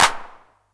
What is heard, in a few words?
909 Clap Tape; Perc; Goldbaby Tape808 & Tape909; The Tape 909